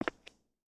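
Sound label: Foot Concrete Sneaker Walk, footsteps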